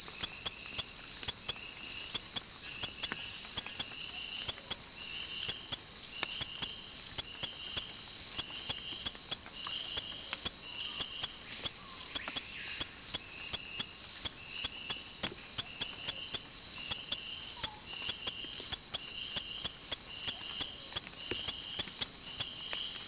Allobates caeruleodactylus

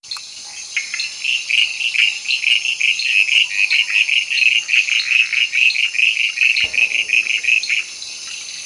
Dendropdophus leucophyllatus

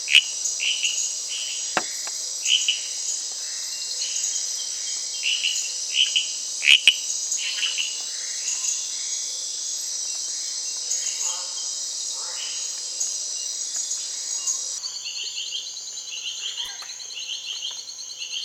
Dendropsophus sarayacuensis

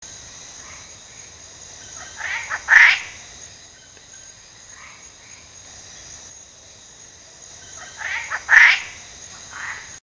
Hyla lanciformis

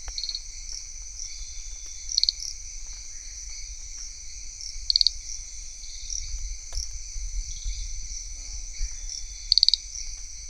Pristimantis ockendeni

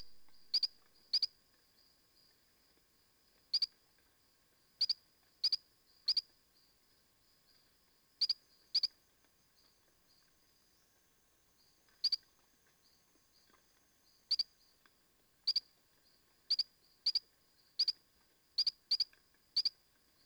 Allobates

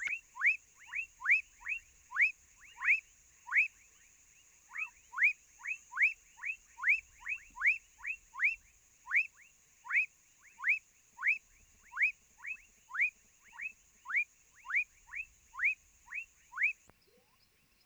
Leptodactylus fuscus

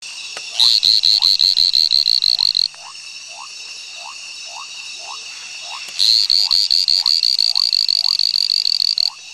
Chiasmocleis hudsoni

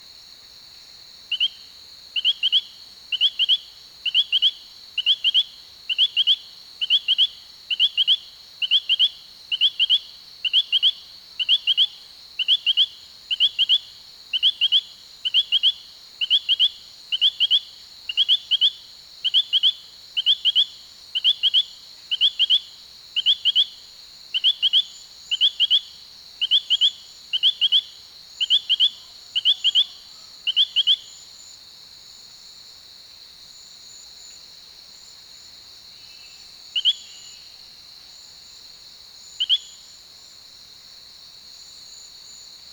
Allobates femoralis

